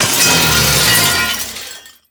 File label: Death; Spark Drill